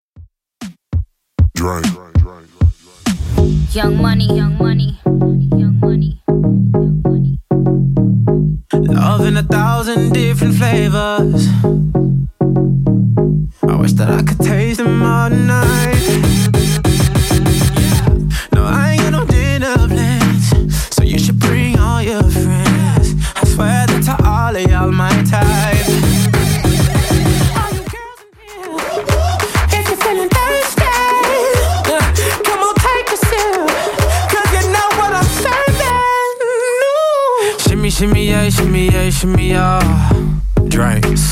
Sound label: 98bpm; Redrum